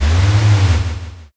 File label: servo